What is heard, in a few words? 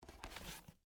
Bible Pick Up, Book; Foley; Book Sound Effects; Offices; Blast Wave